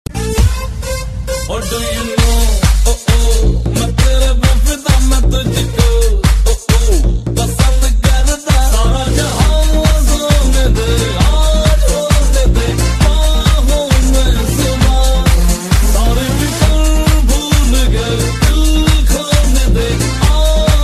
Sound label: Boom Boom